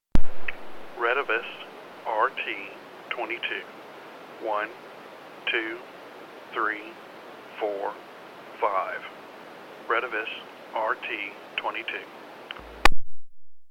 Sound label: retevis rt22